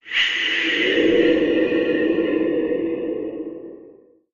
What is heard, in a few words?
Meteorite Near Miss